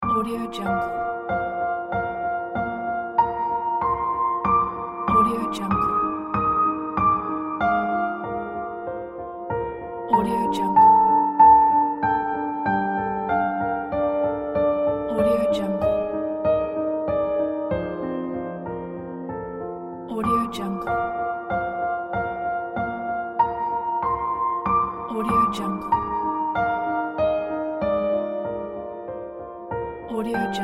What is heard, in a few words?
piano contemplation